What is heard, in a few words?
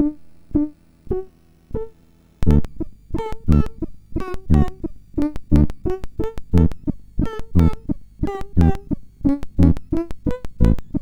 sid, MOS6581R4